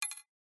industrial metal washer drop or set down on concrete paver